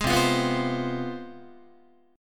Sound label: C Major 7th Suspended 4th Sharp 5th, down, Guitar; CM7sus4#5 strummed; JGuitar